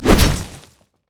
bushwhack; nidalee base

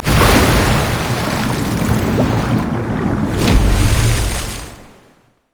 tidal wave; nami base

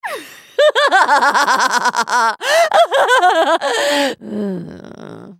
laugh; Cassiopeia; Character Voices - English

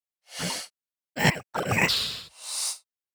lizard elder bored; LoL; monsters

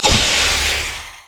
smoke grenade; LoL; graves

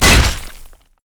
basic attacks; Vi

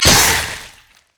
heat seeking missile; LoL; urgot

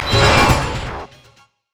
orb of deception; LoL; ahri skin04

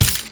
Ball Flash Reverse; orianna

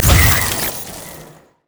upgrade; LoL; heimerdinger

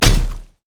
pirate basic attack; LoL; gangplank

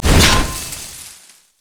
bushwhack hit; nidalee base

